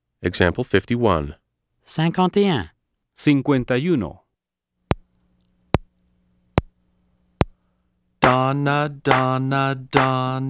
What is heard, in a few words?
Keyboard